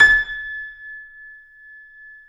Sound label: C7 FF, The Ultimate Piano Collection; East West